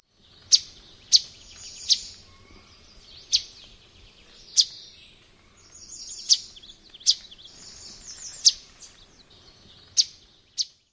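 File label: Chestnut-sided Warbler alarm chips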